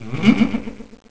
Hmm; Gustaf: "Hmm?"; Zelda

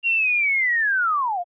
Darunia Fall; zelda; OOT

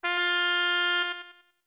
Notes Pipes F long; Deku Pipes (long)

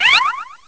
Bubble Form; A bonus bubble forming; zelda

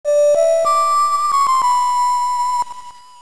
Ocarina Of Wind; Playing the Ocarina of Wind (updated with better quality)

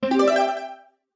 Main Menu Copy Erase Finish; 'Finish' sound also used when saving during gameplay

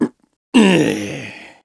Stretch; Groose stretching; zelda sounds; Skyward Sword

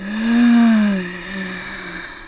Sigh; Sera sighing

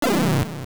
Enemy Die Power; An enemy killed WITH POWER

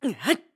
Throw; BOTW; Breath of the Wild; Link

